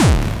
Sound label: hard bass drumz